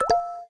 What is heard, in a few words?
raindrop clear; Samsung; GT-B7320 Omnia PRO ATT